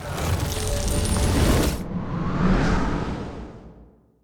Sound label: Fall Platform Elemental Wind Cast Gust Medium; ascendance